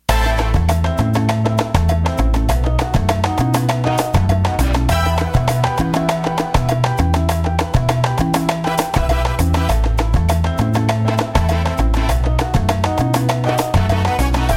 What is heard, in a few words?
Salsa